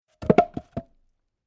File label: Domestic Large Log Dropped Onto Log Pile Wood Impact And Roll; Miscellaneous Impact